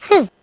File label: hunter pain short; huggy wuggy snpc; elmo